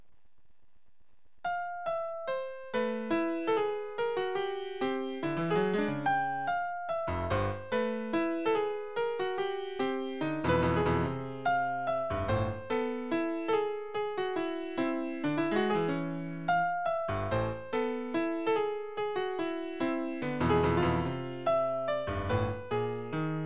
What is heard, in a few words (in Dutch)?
stukje voor piano